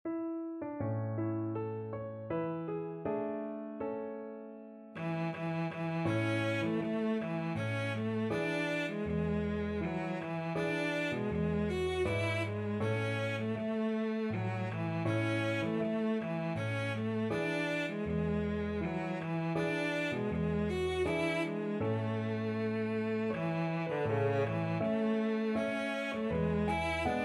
cello

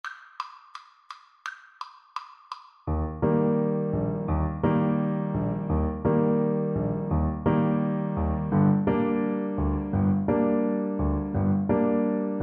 Tenor Horn

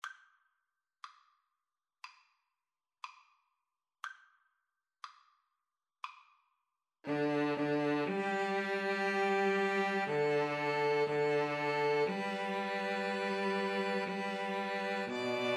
zimbabwe national, String trio

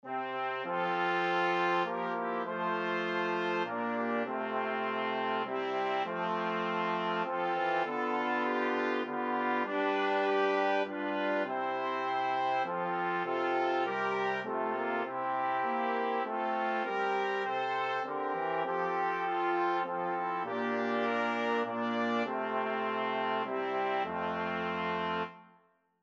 brass quartet

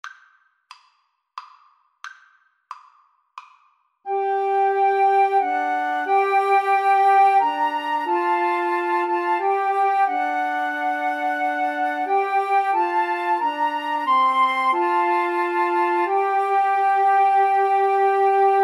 coventry, Flute Trio

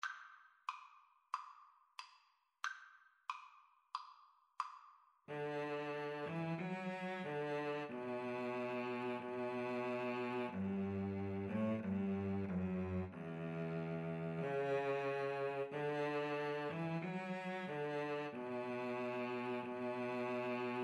Violin Cello Duet; bleak midwinter

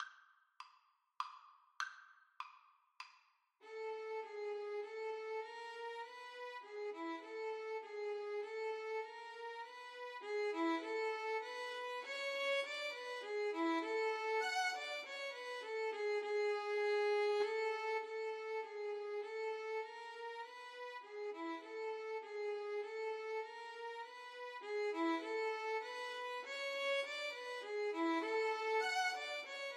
Violin duet

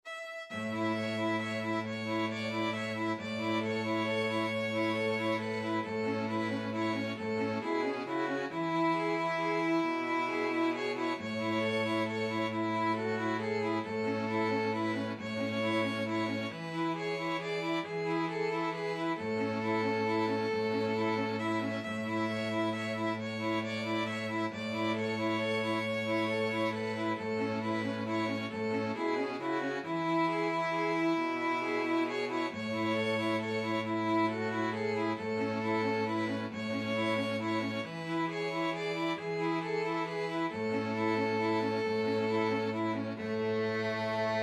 string trio

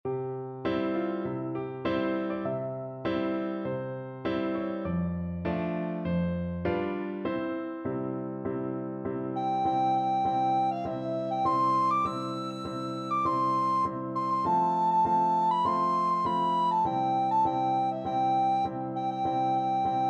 battle hymn, recorder

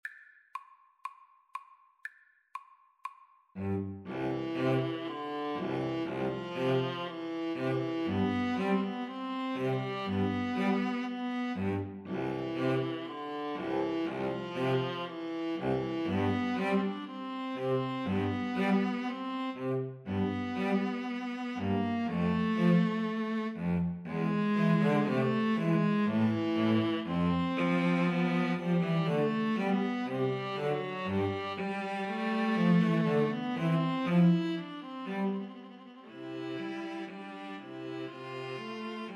string trio

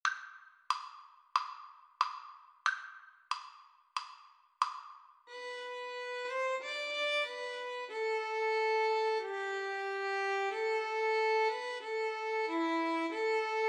violin duet

